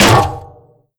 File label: vent step, headcrab reviver, creatures